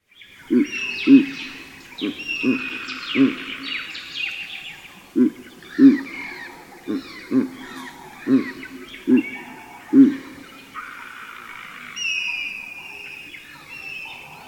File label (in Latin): bubo lacteus, ptaki